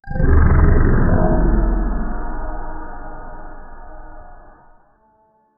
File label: game sound effect slow buildup